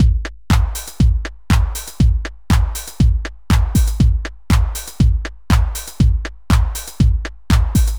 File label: 120 trance beat, Drum & Perc Loops